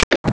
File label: shut; ST-XX Amiga Soundtracker Sample Packs